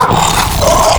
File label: vox abandon gurgle, zombie